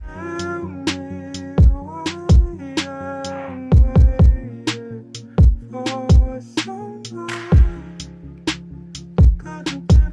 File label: sad type beat